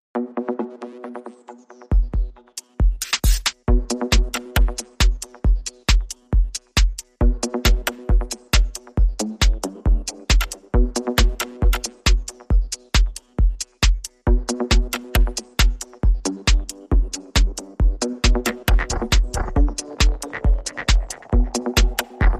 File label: Electro Glitch